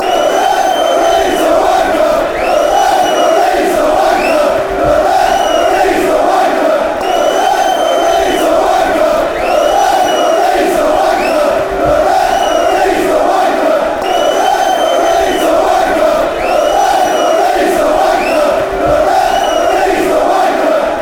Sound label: referees chant; wnker; stfc